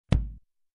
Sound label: Kick Drum